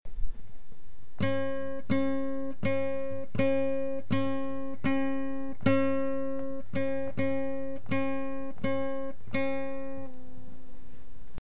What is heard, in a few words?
dotted quarter note, classical guitar